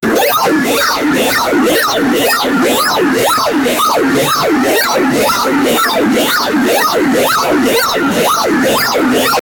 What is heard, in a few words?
bubbly princess farts